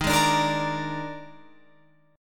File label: Eb Major 7th Suspended 4th Eb, Guitar, Open F; EbM7sus4 strummed